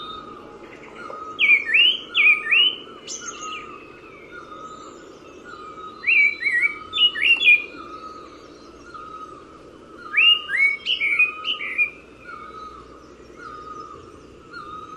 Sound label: Black breasted Thrush Turdus dissimilis, Nagaland bird songs